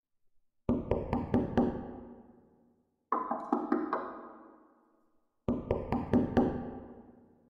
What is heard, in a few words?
knock on window